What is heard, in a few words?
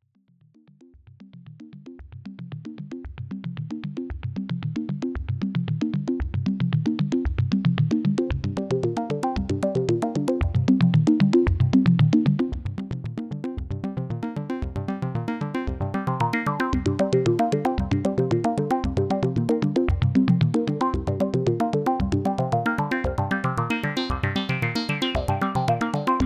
Arpeggiated Oberheim OB 8